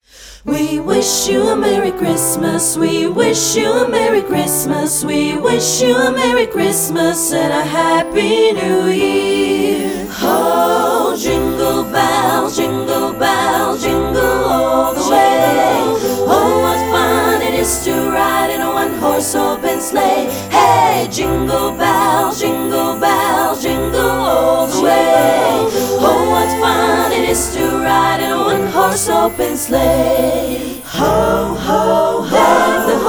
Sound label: Christmas Classics Piano Vocal Acapella